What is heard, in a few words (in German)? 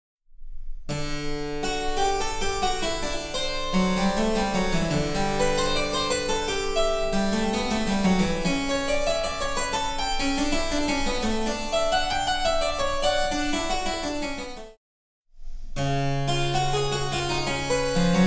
Cembalo; Mark Lindley WTC 1